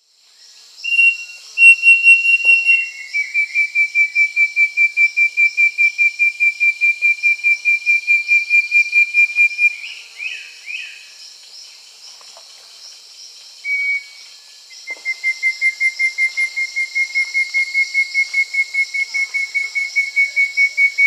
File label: Southern Nightingale-Wren (Microcerculus marginatus); Peru; S. America